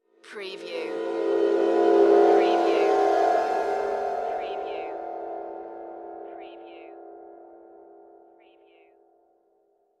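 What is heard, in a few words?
Production Element Cymbal Swell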